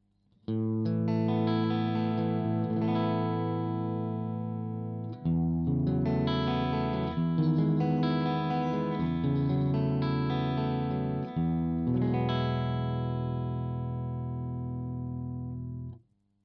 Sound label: clean Position 3 KSM313 front